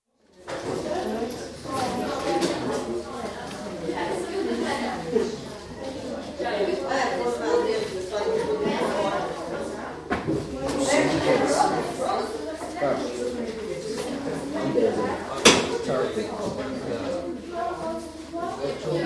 Mixed Comprehensive School, classroom atmosphere, 5th Year Pupils chattering, chairs occasionally moved & door banged; BBC